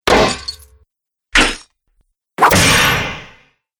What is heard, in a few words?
Hits Bludgeon Chainmail Armour